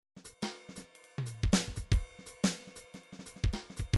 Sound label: midi grooves bebop; Bdf Midi File Grooves